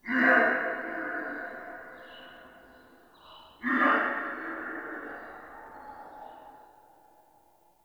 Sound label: wetland forest day creature distant